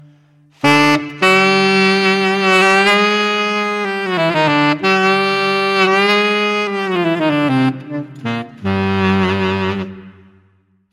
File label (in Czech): Vzpomínky dvou saxofonů na mládí